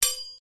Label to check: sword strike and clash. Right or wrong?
Right